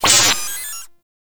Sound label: Proto Infested Mutalist Pain; Warframe